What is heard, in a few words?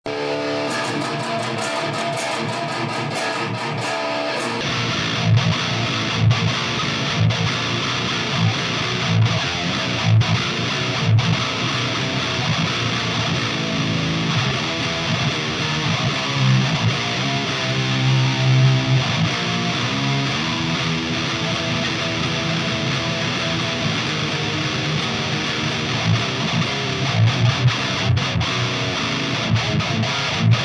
riff